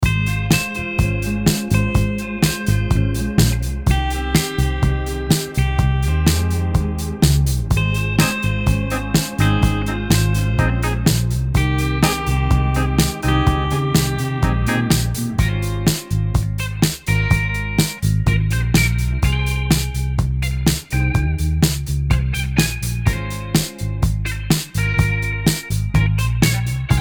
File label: vintage pop guitars; sonic collective